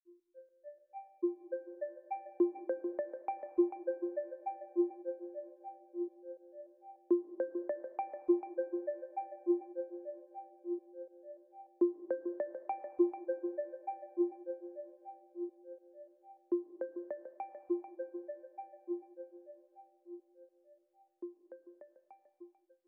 Jingle, Wii U Menu Loading

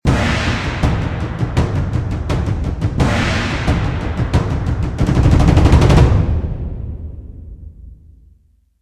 platinum Summon Drum

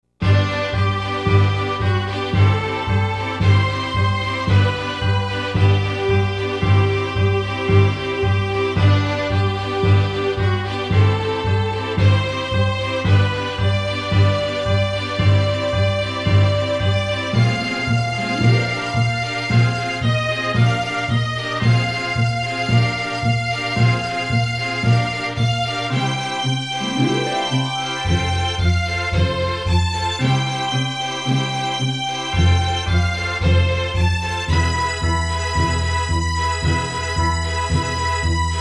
Synthesizer Version; Super Famicom Magazine Volume 13

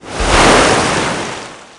Fizz Water Level Raise; Candy Crush Soda

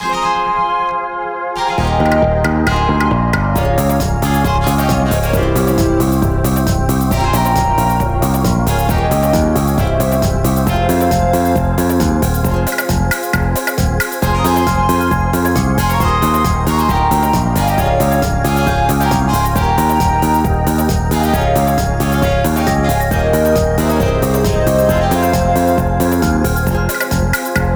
heroic

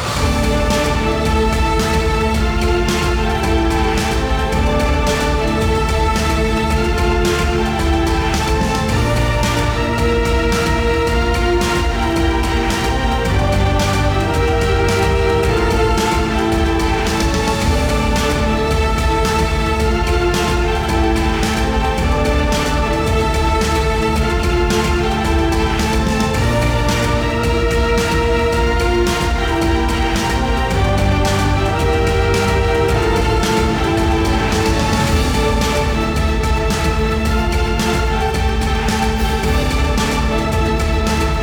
Kill God Fighting Loop; bright memory mobile

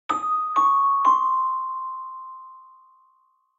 Marimba

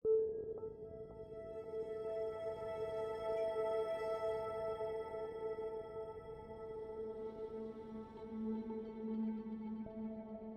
85bpm; Legends of Pegasus; windows gamerip 2012

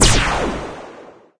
Ufo Lazer; candy crush saga gamerip

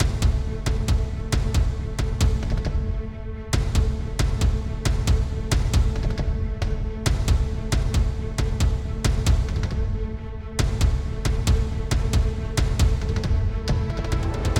Battle

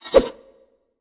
Queen Attack swhoosh; we are the dwarves gamerip 2016

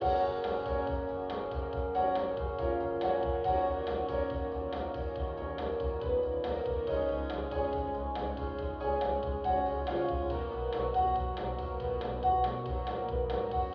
grocery muzak; Undead Overlord

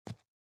SFX run rock; out of reach treasure royale 2020 gamerip pc

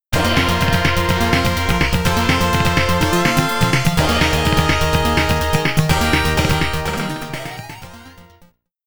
CALM ROUND CLEAR

